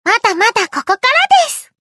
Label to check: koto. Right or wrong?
Right